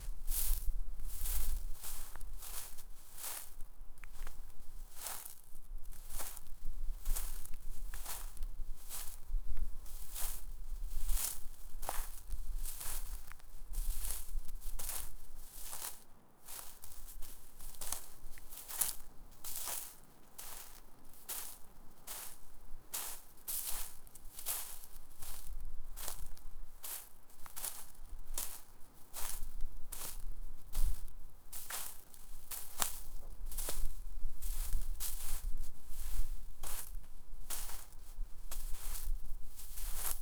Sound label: sliding on grass, outdoor activities